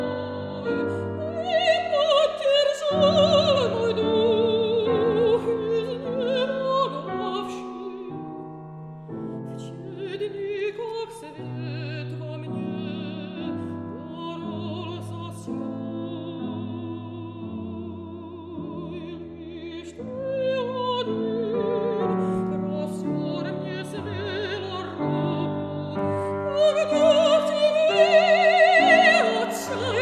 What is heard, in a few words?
mezzosoprano, piano; P.I.Tchaikovsky; Lis ty adin